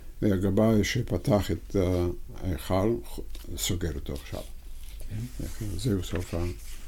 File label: note echal closed; Kippur Arbit